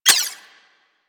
Primary Mech, Weapons; Sapient

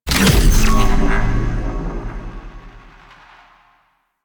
Railjack Attractor Fire; Warframe; DE; Vehicles; RailJackPowers

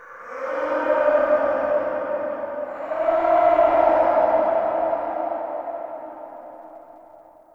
Interior Texture Aggression Calls; Warframe; Infested Eris; Misc Indoor

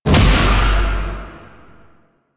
Void Beam Start, Weapons; Duviri; Incarnon Adapters

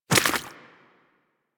Infested Small Walk Water, Footsteps; Warframe

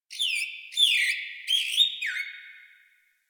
Tree Critters Happy Calm; Warframe; Duviri

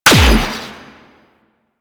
Air Pistol Shot; Warframe; Corpus

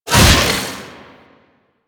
Melee Whoosh; Warframe; DE; Sentients; Erra; Weapons

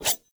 Dagger Whoosh Heavy; Weapons; Enemy; Daggers

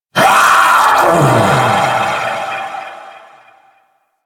Vocal Death; Warframe; Duviri; Dax; Vocal Set A

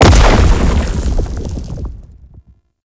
Rock Fall; Horrors; Warframe; Man In The Wall; Octopede

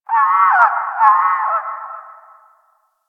Bird Distant; Ambience; One Offs; Warframe; Eidolon Plains

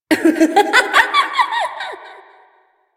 Evil Twin Doppelganger Laughter; Warframe; Entrati

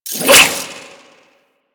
Whip Whoosh; Grineer

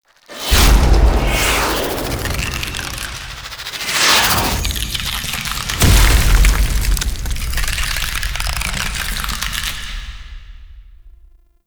Angel Reveal SFX; Warframe; Zariman Quest

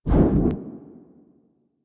Dash Burst; Powers; Movement; Warframe; Focus; Operators; Wraith

